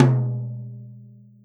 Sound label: Mid Tom, drum; drumthumper; oboe